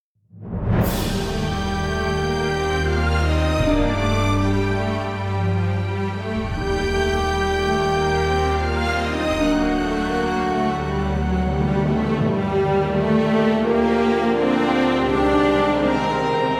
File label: Synchron Duality Strings; Vienna Symphonic Library - Synchron Duality Strings: Regular (Full)